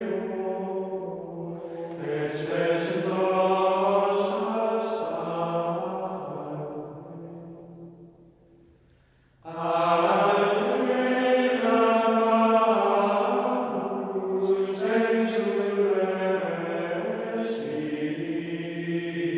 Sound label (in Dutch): Koor (mannen) Gregoriaans; Salve Regina